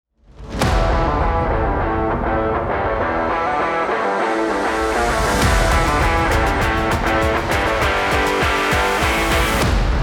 upbeat upbeat rock

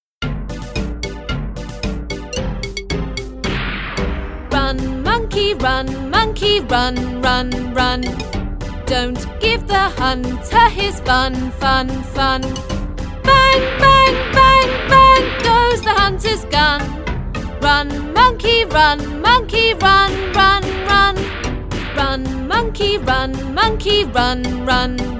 Run Monkey song

